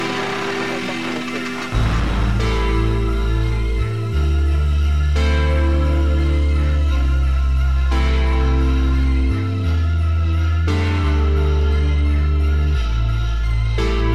beat

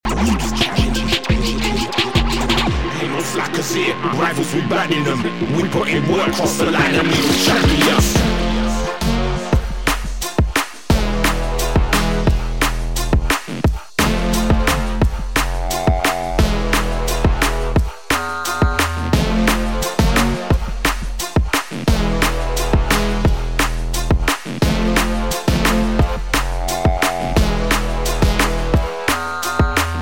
piano

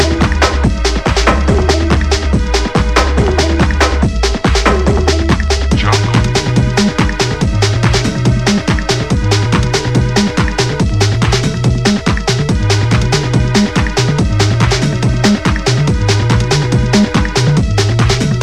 noise jungle

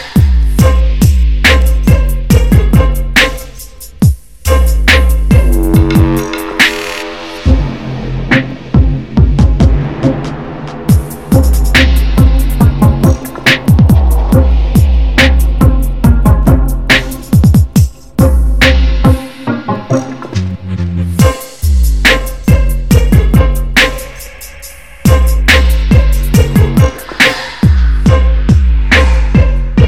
taiko

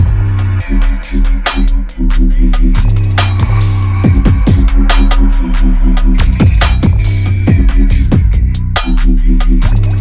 drone fear

